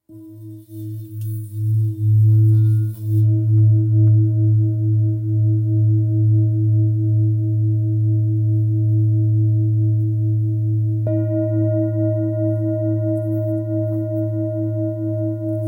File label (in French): Bol bengale mi